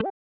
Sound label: Press Button Controller